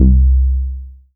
Rubber Bass; Best Service Gigapack 2

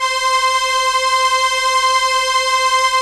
Strings Arp SE C6, ARP Slow Str

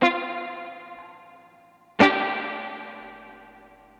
Guitar, 70s Analog Funk

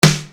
Snare Dr

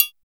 REAL TRI, TRIANGLES

